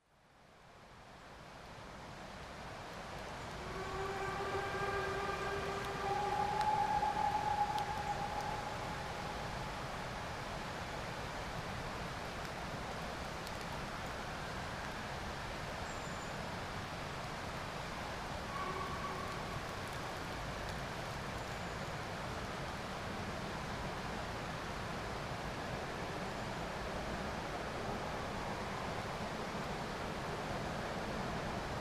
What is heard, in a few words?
between Ingrow and Damems Loop, steam